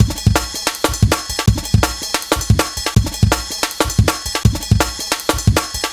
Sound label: Dynamic, Loops, breaks; m8 breaks